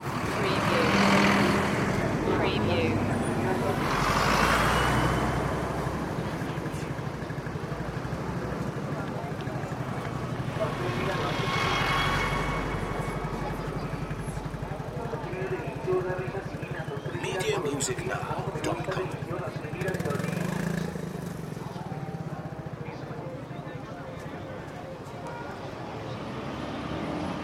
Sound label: city traffic bogota; environment; the sound pack tree